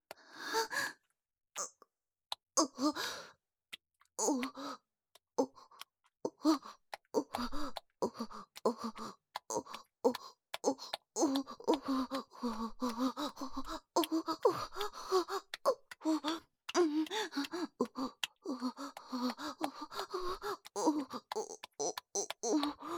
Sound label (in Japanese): オホ声; SEあり